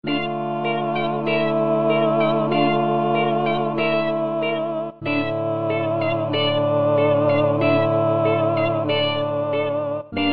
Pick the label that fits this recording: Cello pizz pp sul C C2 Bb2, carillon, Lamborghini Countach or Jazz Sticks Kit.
carillon